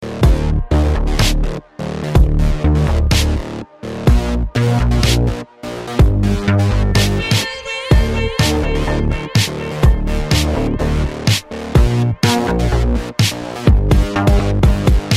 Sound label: Electro House